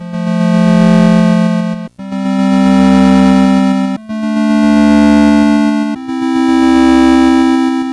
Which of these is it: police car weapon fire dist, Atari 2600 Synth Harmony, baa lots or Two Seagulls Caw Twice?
Atari 2600 Synth Harmony